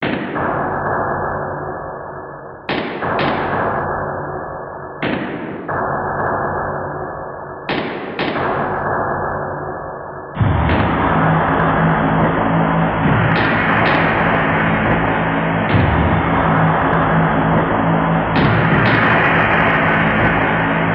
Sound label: Run; silent hill tribute